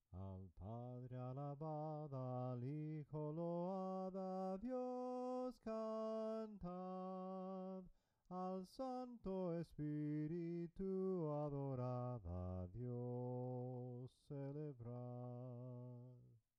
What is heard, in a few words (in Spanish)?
SOPRANO CANTADO